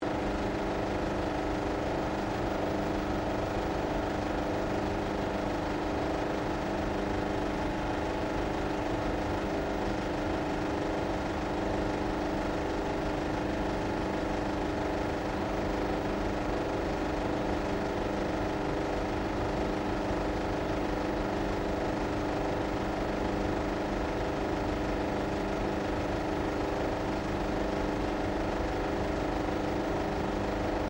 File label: PROJECTOR CINEMA 35MM OPERATING MID LOOP, Modern Cinema projector; Projectors; Offices